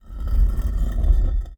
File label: scroll monolith